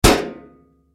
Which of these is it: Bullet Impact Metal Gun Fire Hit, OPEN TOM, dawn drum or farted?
Bullet Impact Metal Gun Fire Hit